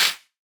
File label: clap; goodtakimu drumkit; fl studio; drumkits